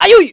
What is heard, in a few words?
hit voice ouille; impact